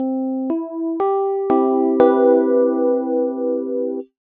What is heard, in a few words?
C Major Mix